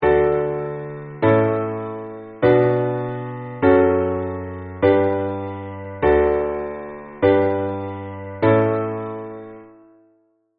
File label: canon